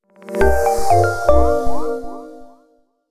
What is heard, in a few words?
logo splash